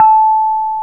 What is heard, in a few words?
reyong mid, gamelan; gravityharps